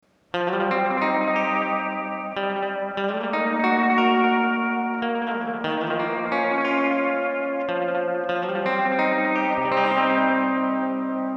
Binson Echorec E Gitarre; T-REX

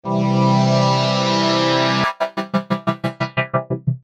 gaten; cubase4

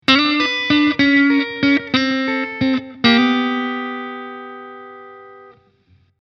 Blues Licks Ende

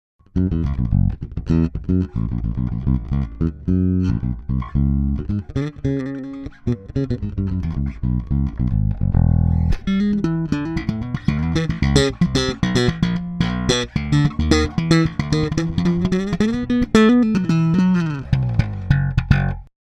E Bass DI in; Black Lion Audio Auteur

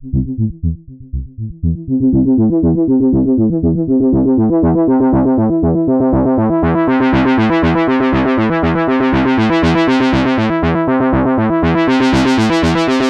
Moogerfooger Synth Freq regler Und Mittlere Oktave

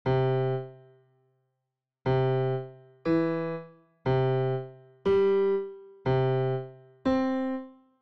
Intervalle Rein

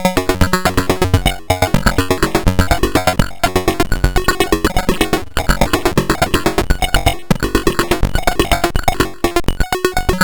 Drums Chip Tune kurzes Gate LFO auf X Parameter; Error Instruments

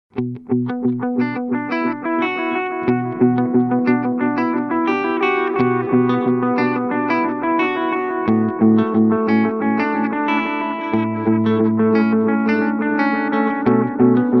Kurze Delayzeit; AnalogMan ARDX20

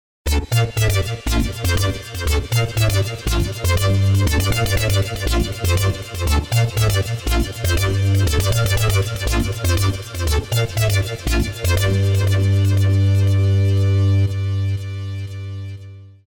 FX Sektion

